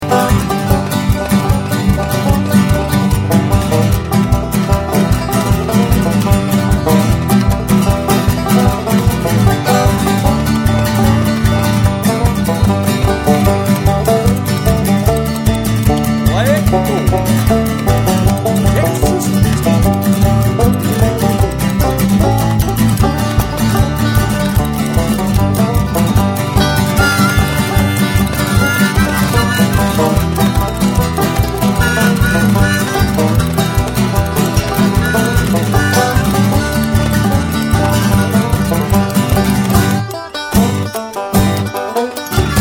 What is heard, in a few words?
banjo